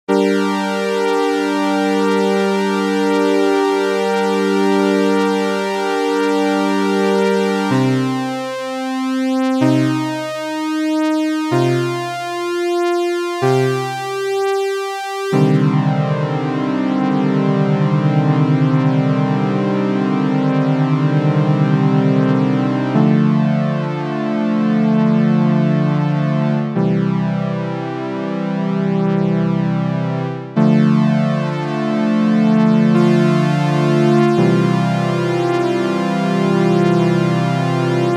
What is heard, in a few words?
Power House Strings